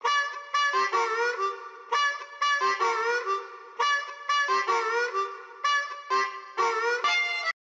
harmonica

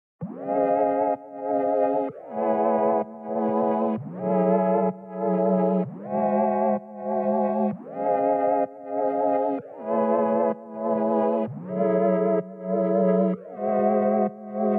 rising sine, synth chords loop rise sine C#min

